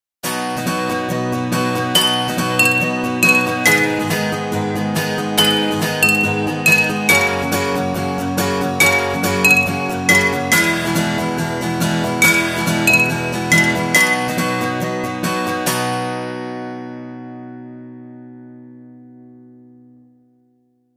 vidrophone sample

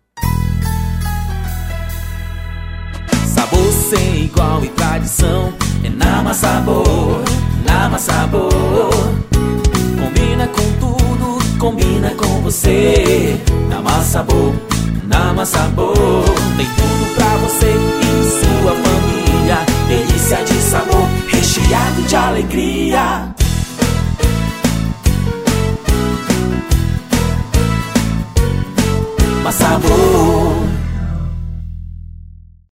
jingle; massabor 2012

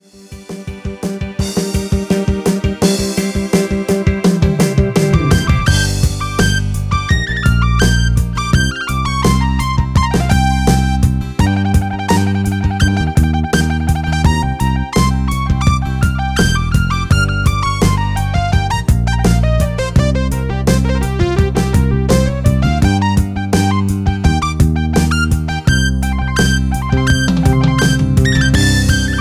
rock solo

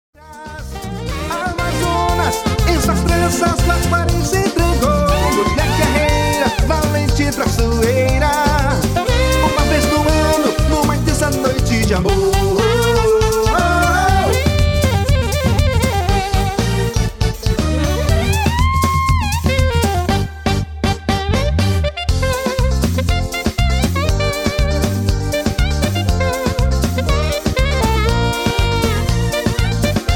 carimbo sax alto solo

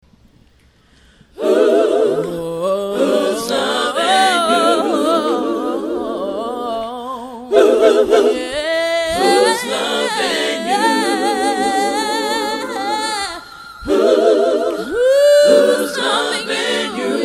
acapella

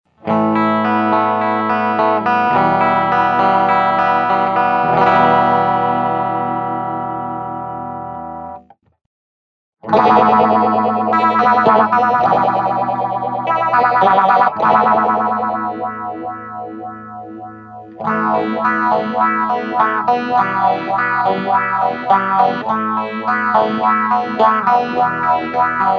crazy phaser, pedals; stardust pedals

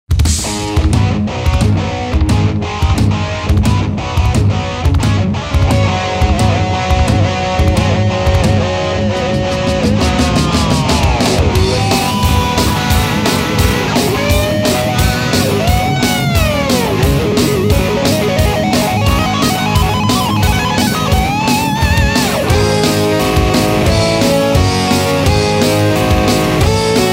rock

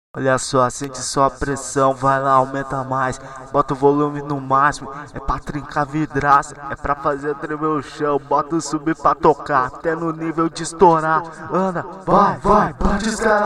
bass test

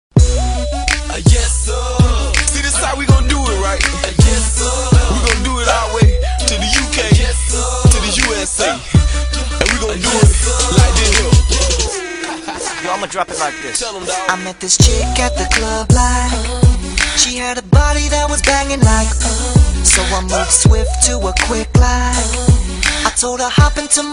best rnb songs ever

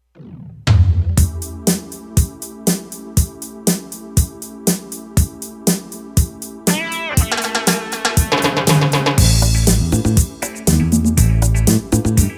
pop

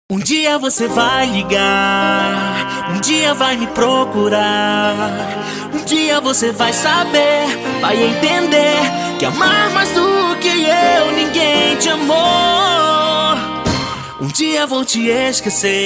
acapella

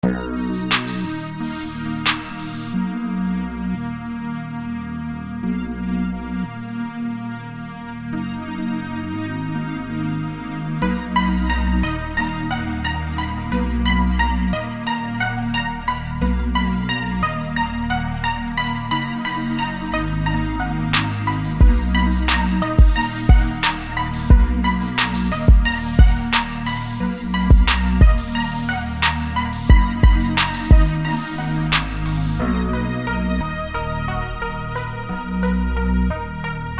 beat instrumental

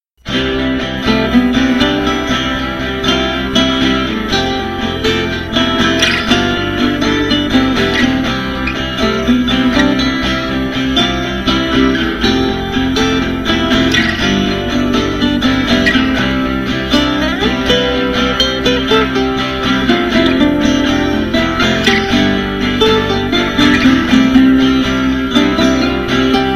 instrumental

